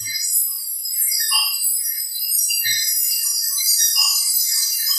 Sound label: alien; animal